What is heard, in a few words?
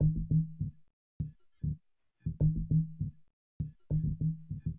Gentle Beat, Acoustic Beats; Sonic Foundry (Sony Creative Software); Myths of Technology